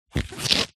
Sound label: paper glossy hand rub squeak; foley